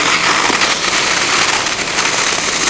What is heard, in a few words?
Scrape; WhiteOut